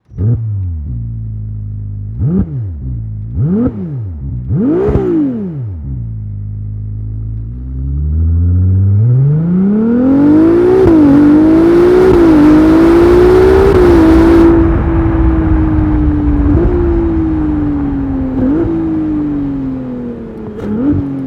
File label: STOCK EXHAUST SYSTEM FERRARI 296 GTB